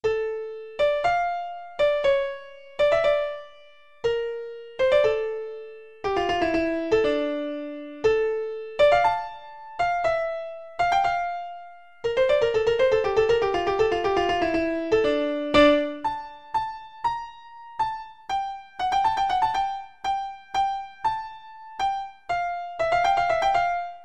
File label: hungarian dance 5 key, keyboard